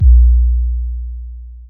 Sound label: Boom